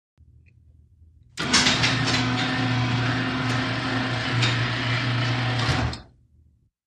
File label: Garage Door Elctrc Op